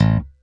A0 SHORT, STIK BASS; D-STIK BASS2

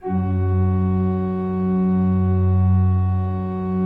F1, Cathedral Organ

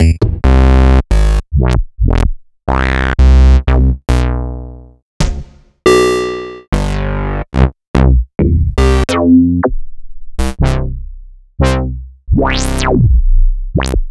Synth Basses; Sliced

